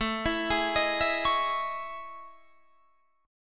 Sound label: A Major Sharp 11th, Guitar, Standard; AM#11; arpeggiated; JGuitar